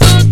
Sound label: HIT ON IT; E-MU Formula 4000 Series Vol. 1; Hip Hop Nation; Phatt 4000